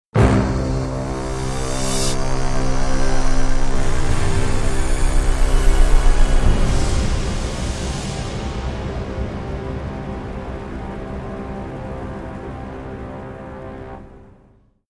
TerrorVille Atmos

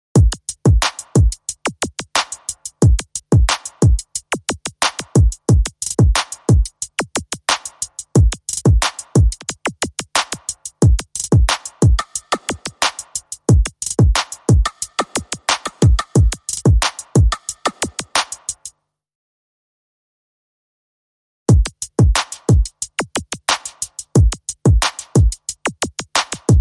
Drums Only

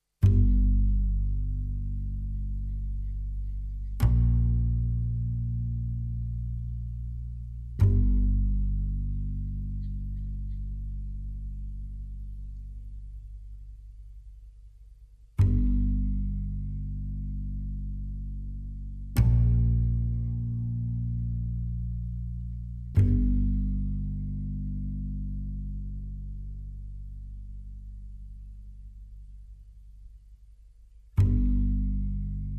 Bass

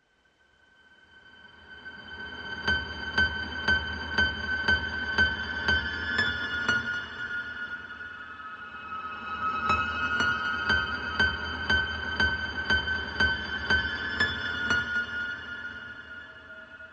Piano Only

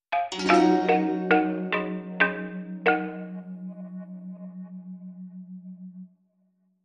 Falafon

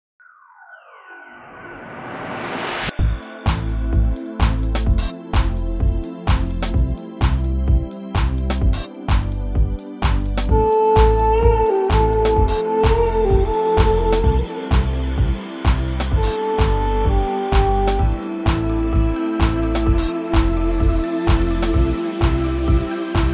Middle East

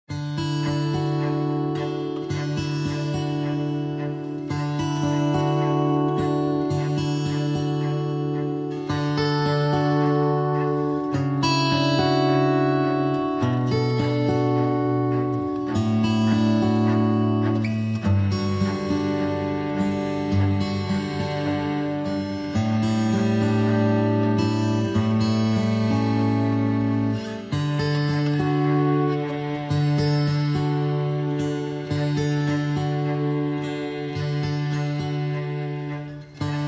No Woodwinds

